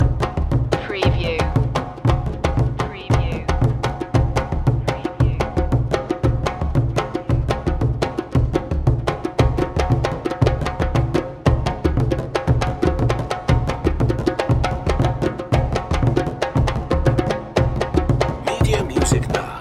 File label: african drums ndengela zalamo dance loop